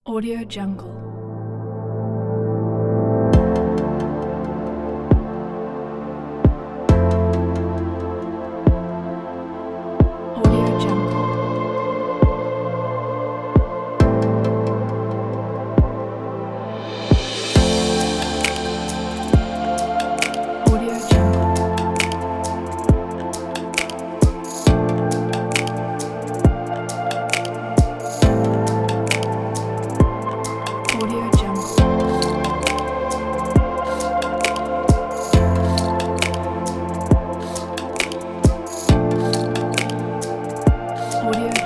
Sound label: calm atmospheric chill